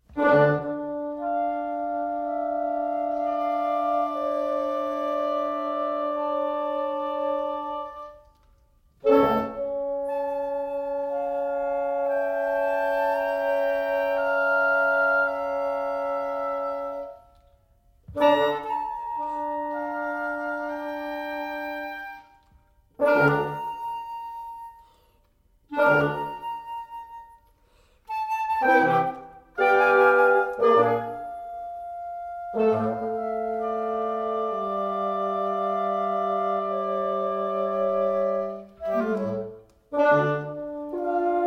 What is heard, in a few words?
wind quintet, lamentoso